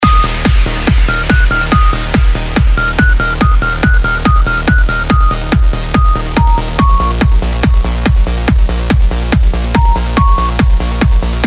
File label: electro ringtone